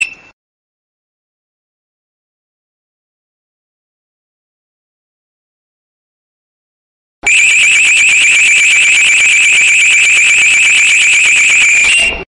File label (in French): Detecteur De Passage Infrarouge